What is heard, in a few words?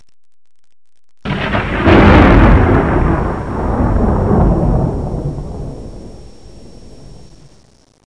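thunder; ziranjie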